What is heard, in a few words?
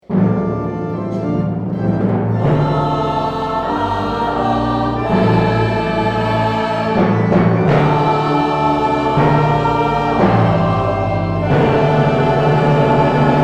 Mass of St Paul Amen